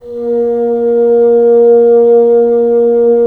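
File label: wind bassoon; LA Composer vol. 3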